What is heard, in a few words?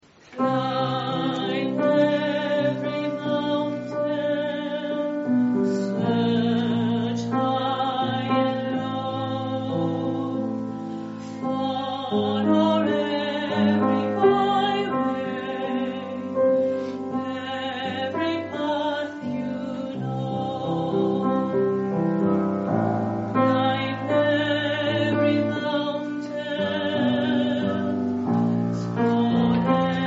alto